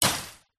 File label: metal pole hollow small hit strike metal fence chicken wire